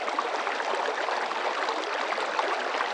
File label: water stream loop, ambient nature; css